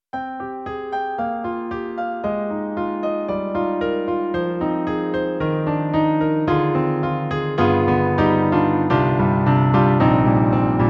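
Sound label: Roland SonicCell Mellow Tune, piano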